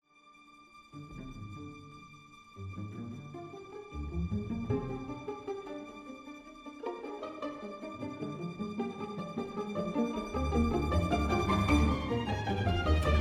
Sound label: perc conc